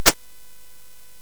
SFX; Narco Police; hvsc

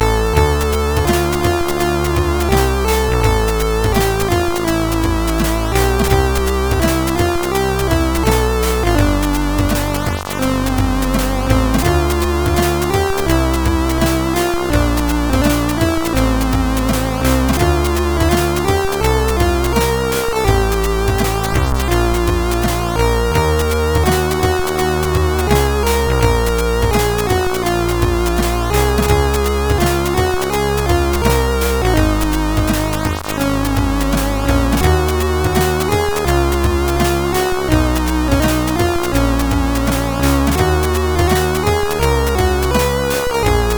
Theme sid CSG8580R5; Sweden; Shade

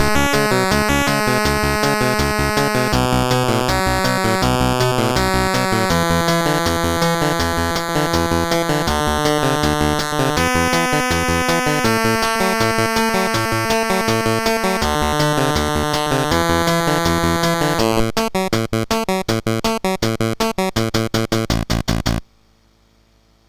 Moon Hop, sid, MOS6581R3

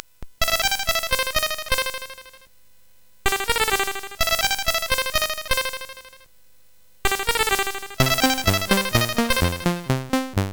Old, CSG8580R5; hvsc

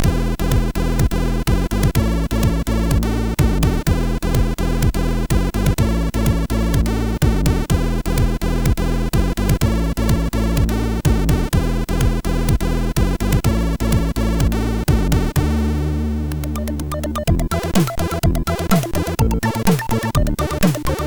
sid, MOS6581R4; Fast Zak

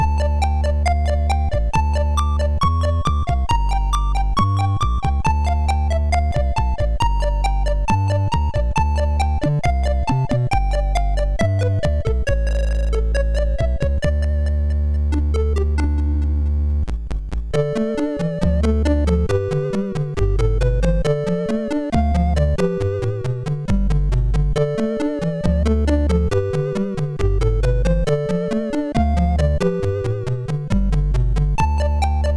sid MOS6581R3; Panic Analogue

